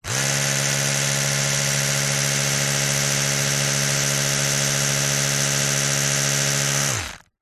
household electric toothbrush run dry static short close up; zapsplat